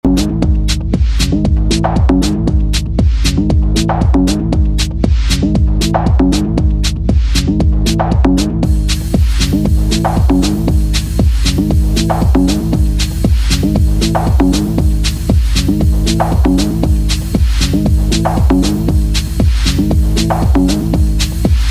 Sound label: slowed down 117 bpm; la techno sans filtre deconstruire les cliches